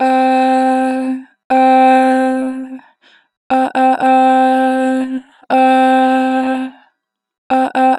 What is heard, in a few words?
Ah, Harmor Vocals, Pitch Flat; 4 bar flat